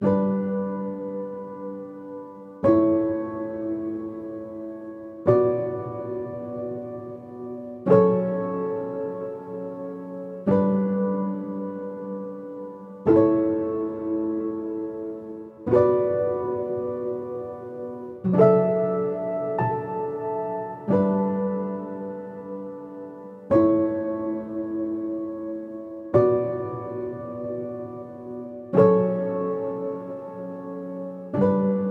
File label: Cinematic Emotional Piano 92bpm D Major